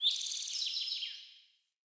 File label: Bird Song; Pothia Bird